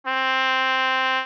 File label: trumpet